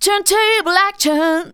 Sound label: vocals sung; Techno Trance Essentials